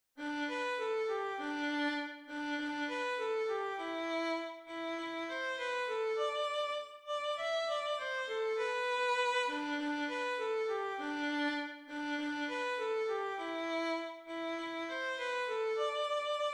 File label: jingle, violin; 징글벨 바이올린 듀엣